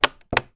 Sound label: household alarm clock large snooze button press; zapsplat